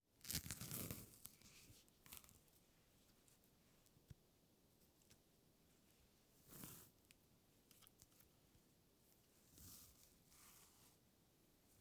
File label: Peeling And Slicing Banana; Fire and food